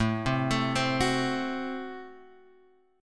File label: A Minor 6th, Guitar, Open G